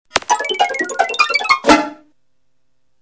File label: gamecube